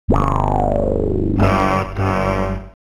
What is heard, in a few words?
Gutter Jingle